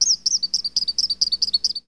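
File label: tropical bird; Counter Strike Model Pack; CS:GO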